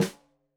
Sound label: Snare Stereo, Drums; Deeply Sampled Drums; SMDrum